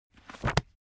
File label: heavy book shut